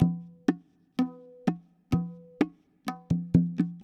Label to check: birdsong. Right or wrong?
Wrong